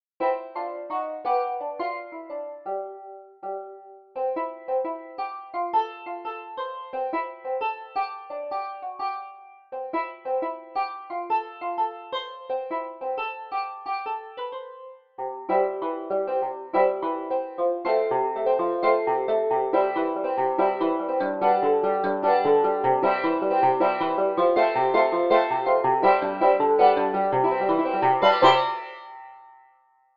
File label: Cheap Banjo